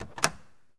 Mustang GT350 Door Open; Automobiles

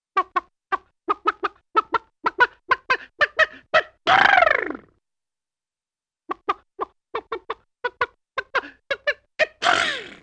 Chicken Sneeze - Human Imitation Of Upset Chicken; Cartoon; Hollywood Edge; Cartoon Trax

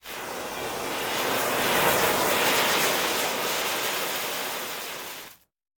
Fuse Black Powder; Sound Ideas; Guns

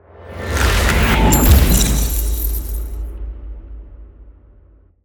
Ice Spell Explosion Impact Glacier Surge; Ice Explosions & Impacts; Arctic Aura

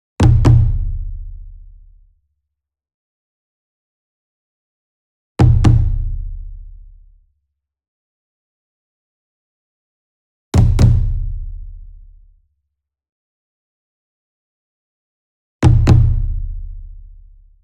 ENSEMBLE DRUM Double Near; boom library; Cinematic Strikes Construction Kit

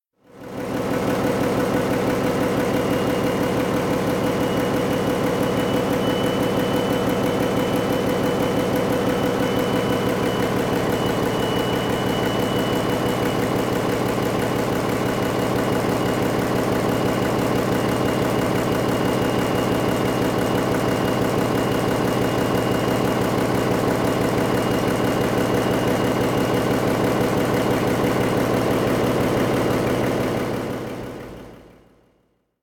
Big Engine; KMP Film Video Sound Effects

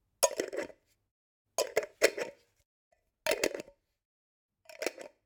Generic Click Plastic Small

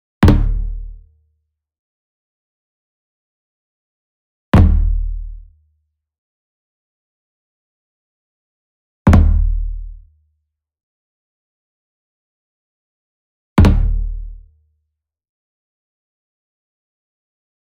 SMALL DRUM Fontonfrom Flam Hard Beater Near; boom library; Cinematic Strikes Construction Kit

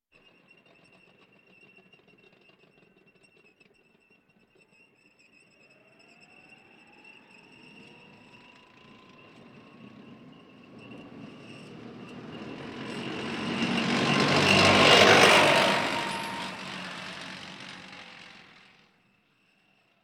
Military vehicles

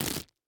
Bandage Tape Rip; Medical; Blastwave

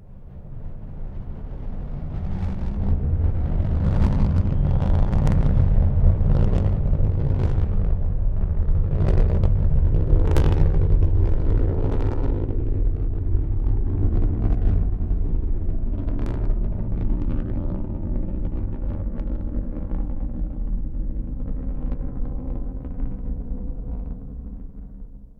Rocket, Take Off; Original Delta 3; captain audio; fully loaded

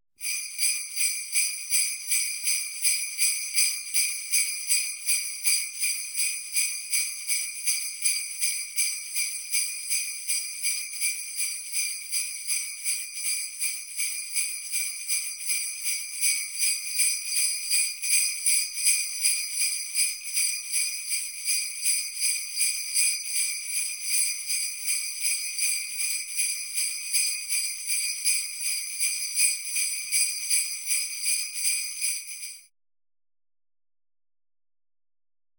Bells Sled Christmas Medium; KMP Film Video Sound Effects

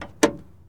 Truck Explorer Hood Open; Trucks

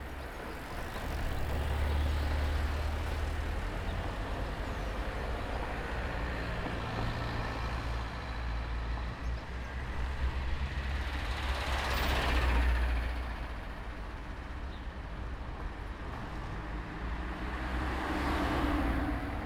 traffic, ambience; NS Studios - ultra sound collection

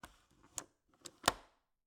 Cabin, window, latch, flip, lock, close, hyper; Eiravaein Works; Vaeyan IV

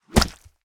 GORE Splat Hit Short; mono; GORE & SPLATS; Universal Sound FX

